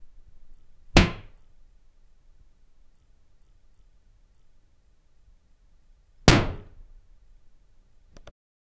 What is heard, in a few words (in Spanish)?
celda cerrndose fuerte